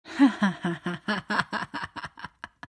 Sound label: laugh; templar assassin